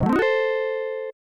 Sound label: Score Points, Weapon Upgrade; Cabal; arcade